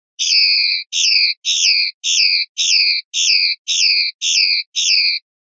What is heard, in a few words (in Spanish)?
golpes; jiajia; pardillo